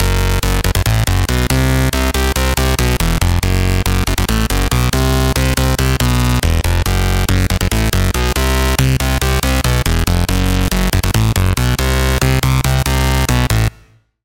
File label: Bass